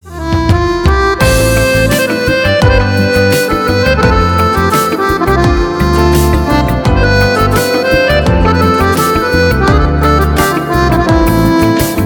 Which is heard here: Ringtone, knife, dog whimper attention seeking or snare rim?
Ringtone